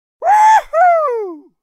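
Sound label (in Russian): krik kovboya, cowboy shout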